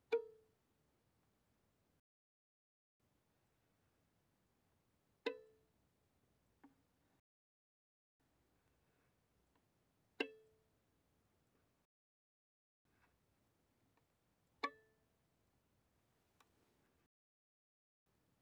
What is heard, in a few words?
Violin pizz pp sul G Bb4 Gb5